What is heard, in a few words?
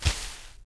Animal Foot Large; Civ4